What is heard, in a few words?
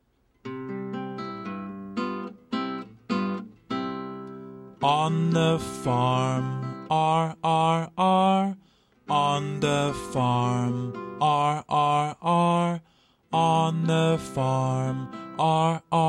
chant; on the farm